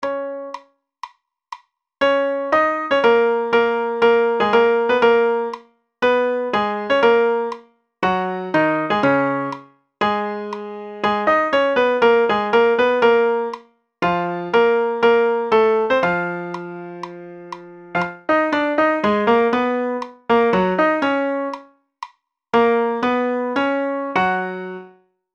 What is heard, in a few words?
Toreador II Tutti; Carmen